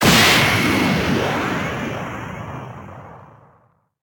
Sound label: Punch